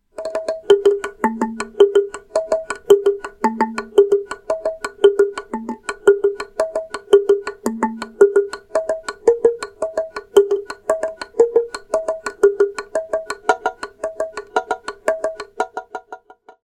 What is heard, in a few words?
Log Drum Chene Peuplier